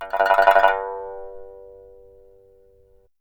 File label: SAN XUAN, G3; Spectrasonics; Heart of Asia